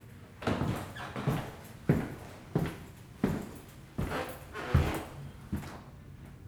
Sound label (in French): pas escalier